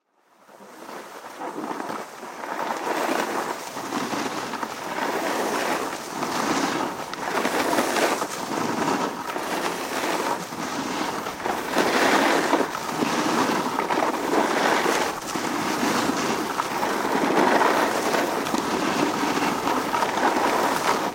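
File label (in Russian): zvuki snouborda, snowboard sounds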